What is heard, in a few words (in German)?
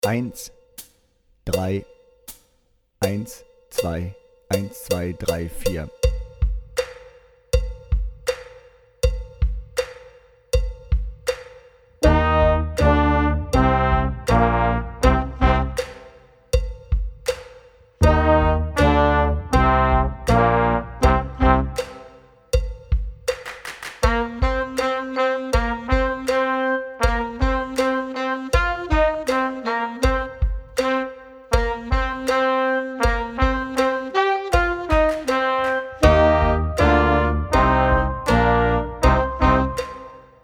Power Rock ohne Drums